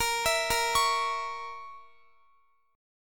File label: Bb Diminished Bb Guitar; Bbdim arpeggiated